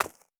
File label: stag footstep concrete hard; Rust